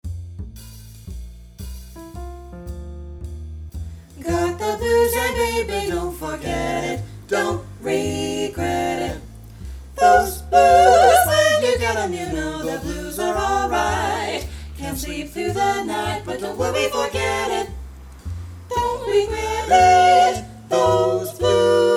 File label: Vocal Blues Etude in F Tenor missing; NHMEA Jazz All-State Auditions and Festival